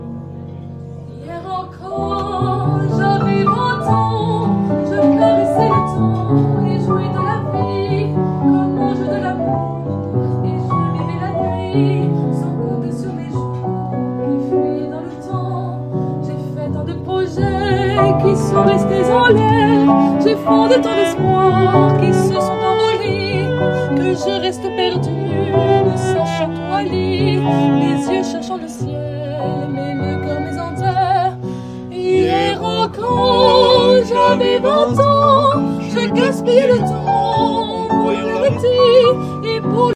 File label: French song